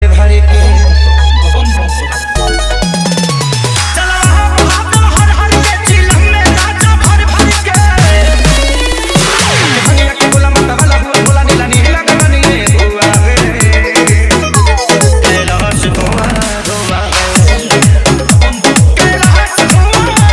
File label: Dj Remix Song Hard Electro House; Dj Abhay Aby Prayagraj